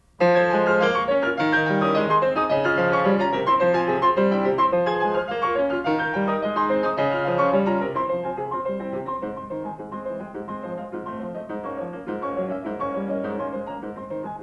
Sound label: Carnaval for piano; Schumann; Op9